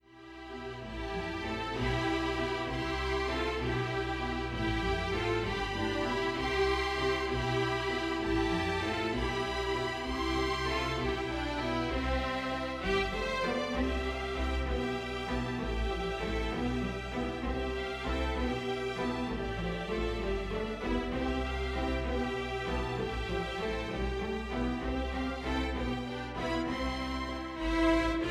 String Orchestra piano accomp

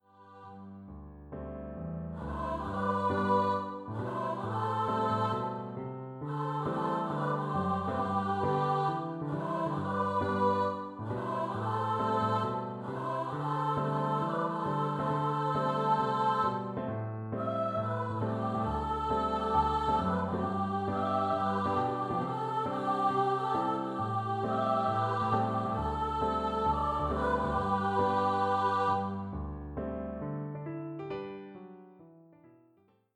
Vocal duet for 2 treble voices

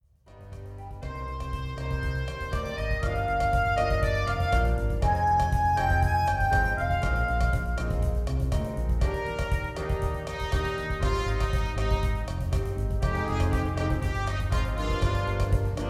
BOSS THE FUNK for Jazz Ensemble

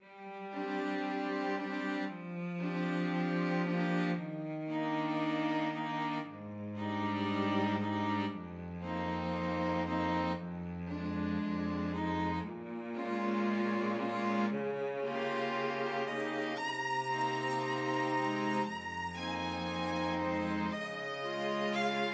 Pledge for String Orchestra

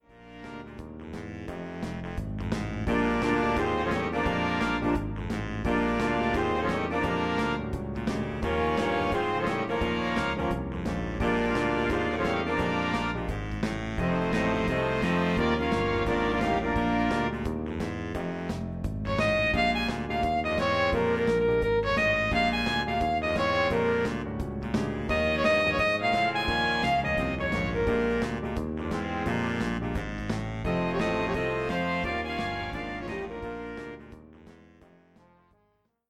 PIGEON BLUES for Big Band

